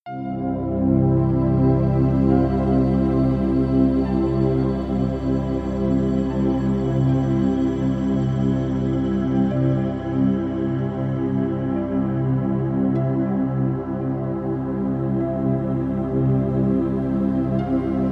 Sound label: Cleansing 528 Hz